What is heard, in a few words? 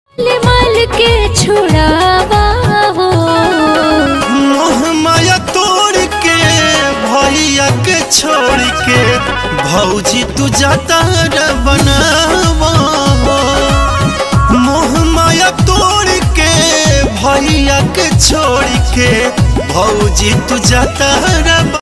bhojpuri ringtone